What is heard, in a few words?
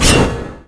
Sword Attack; osu